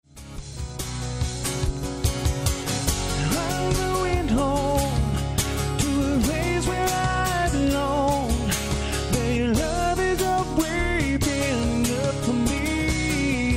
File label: live, Acoustic Guitar